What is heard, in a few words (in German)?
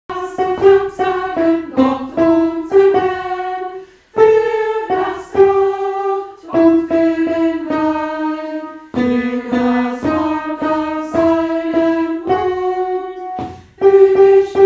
Dankhymnus Alt